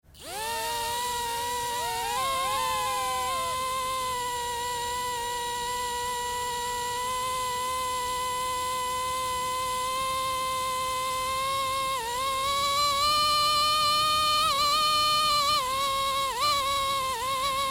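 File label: sounds of quadcopter drone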